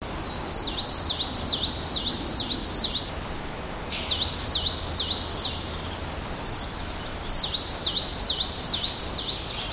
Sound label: parus ater